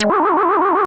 Spin SFX; SMK

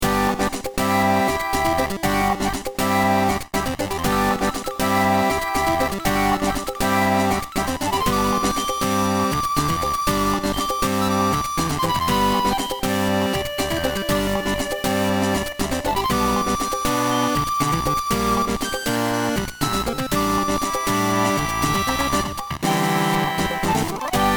Quest Accepted; Shroom City; Item Search

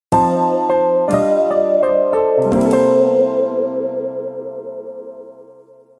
Game Over Theme; SMM2; NSMBU

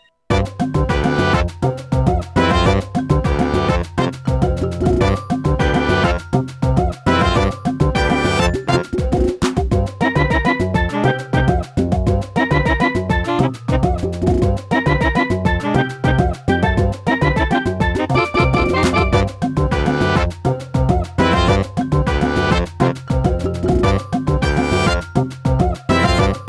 Congratulations; DMOR

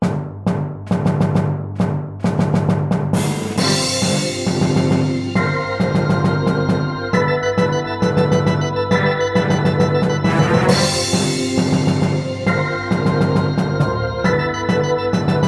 Airship Fast; NSMBW

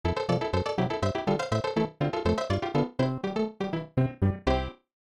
World Clear; SMAS; SMB2

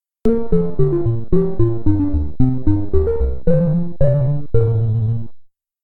Arcade Game Over Theme

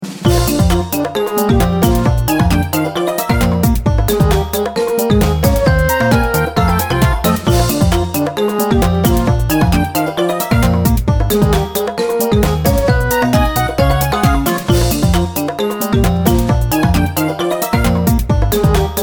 Dice Block Battle

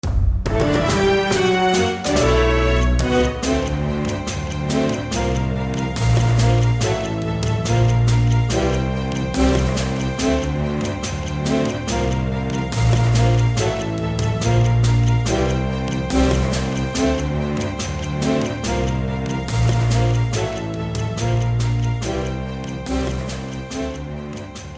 Coin Battle Beginnings

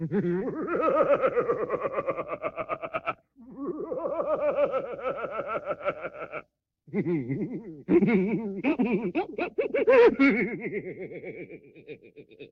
Evil Sinister Laughing

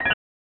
Star Jingle; Mario Paint Prototype

